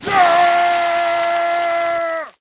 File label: Cheer Knight